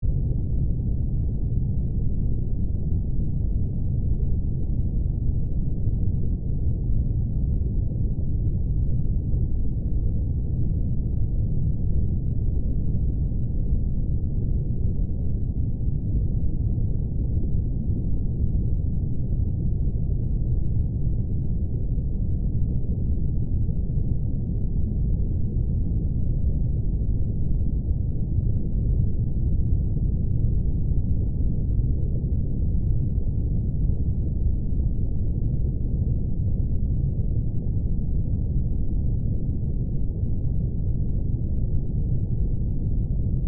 Underwater Ambience, Water, Underwater, Ambience, Loop; Nature; BlastWave